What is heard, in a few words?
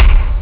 bdrum; ST-XX Amiga Soundtracker Sample Packs